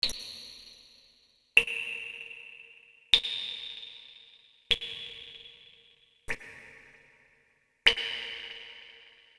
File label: drop drop, factory; PC Gamer IT CD 45; SEED demo